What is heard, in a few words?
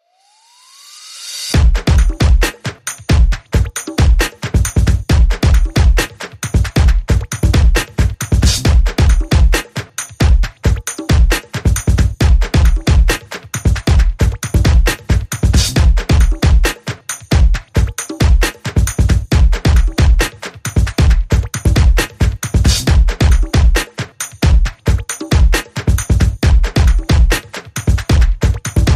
Beat